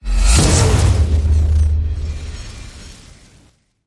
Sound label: bonus x4; Wazdan